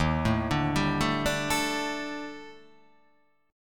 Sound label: D sharp Major 7th Flat 5th; chordshape; 11,12,12,12,9,9,11,x